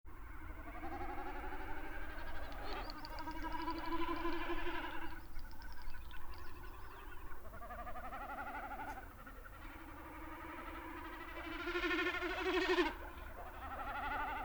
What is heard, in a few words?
Drumming Common And Distant Lekking Great Snipes